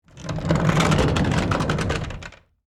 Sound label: Industry, Cart, Roll, By, Wood, Surface; Rolling Sound Effects; BlastWave